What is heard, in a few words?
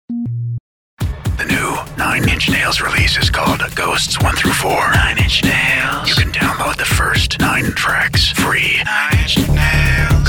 Singing Promos